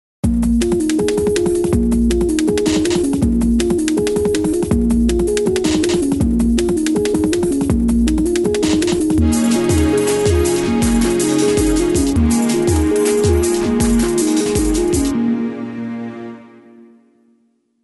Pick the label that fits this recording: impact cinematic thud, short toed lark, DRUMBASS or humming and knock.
DRUMBASS